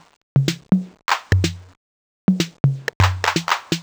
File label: drum clip percussion 125, Classic Chicago House Drum Loops